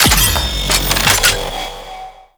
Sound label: Front Line Ammo Dropoff; Tau; Twelve Minute War